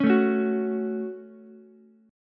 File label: Gb Minor Gb Ukulele; strummed; JGuitar